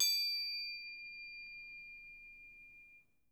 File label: D4, Percussion & Harp; Miroslav Vitous - Percussion & Harp